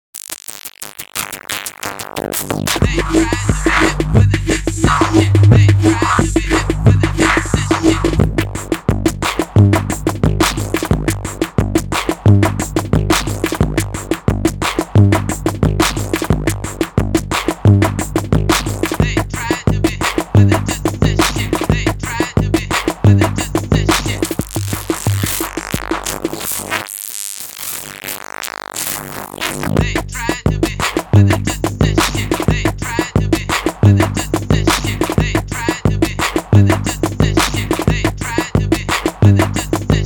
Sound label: Raw Hip Song